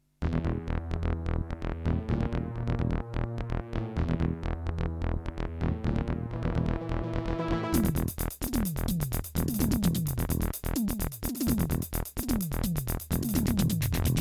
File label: Terratec WaveSystem SCW001-2; Descent - Intro